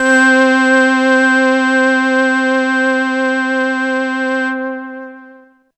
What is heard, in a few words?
Ensoniq ESQ-1 Sympy C4